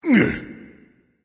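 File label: nonverbal grunt lift; smite; voicepack; ullr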